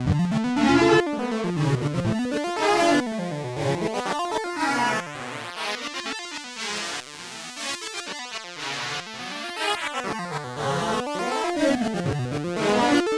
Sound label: Erica Synths Black Hole DSP2 Synth patch CV